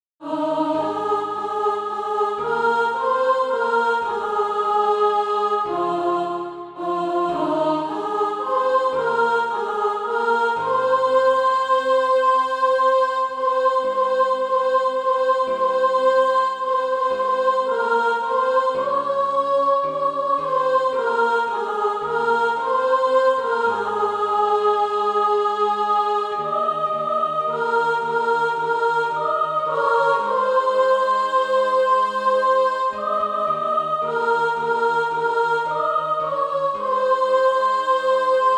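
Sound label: soprano, Practice Music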